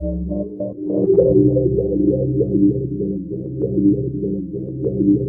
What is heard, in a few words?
AMBIANT, Special FX Twisted; USB Soundscan; AKAI